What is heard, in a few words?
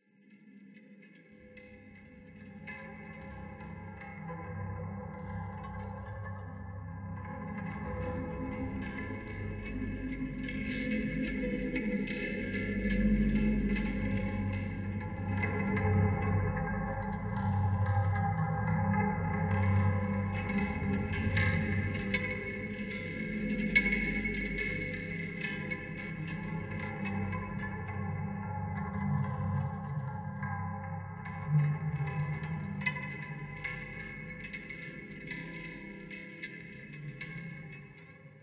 Woodhits Sample